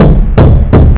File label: knock; OBEs; An Accidental Expert's, How To Leave Your Body Handbook; Alan Guiden